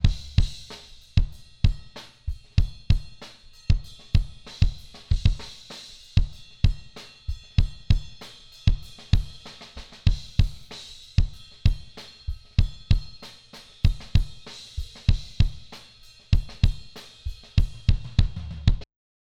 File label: Kick